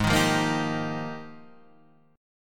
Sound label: F Minor 11th, guitar chord